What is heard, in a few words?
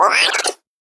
Satisfied Squirrel Death